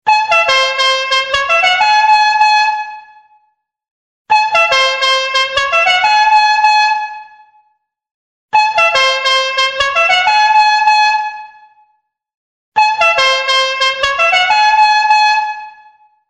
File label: horn ringtone; general lee